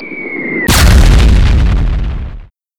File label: hit